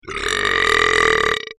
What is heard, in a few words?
brap; I belched that all out at once, one take, no breaks.